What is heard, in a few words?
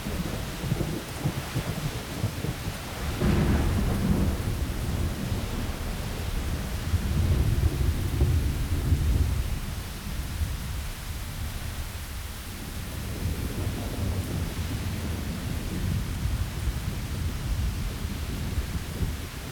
Jungle Rain Thunder; TopFront L-R